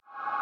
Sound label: ambience